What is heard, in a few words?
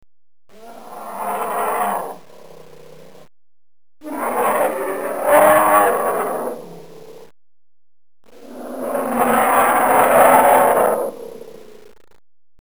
Bear Growl